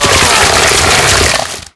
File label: chainsaw gore; npc; melee; infected